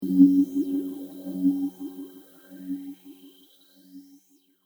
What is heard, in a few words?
pad